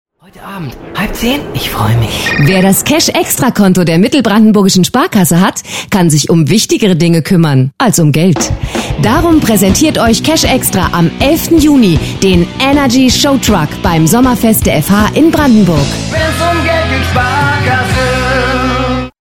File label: Funk Spot